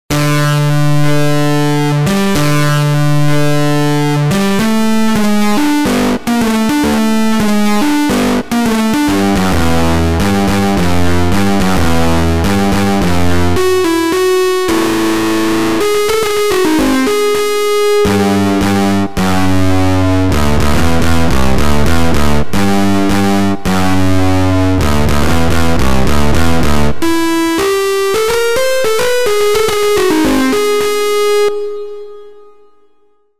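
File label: guitar pickup trim overdrive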